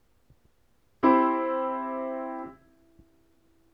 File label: piano do mi so